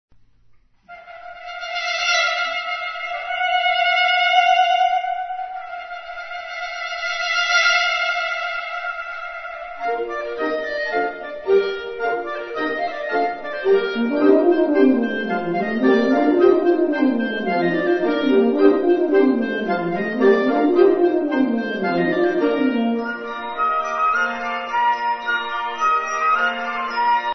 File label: russian folk songs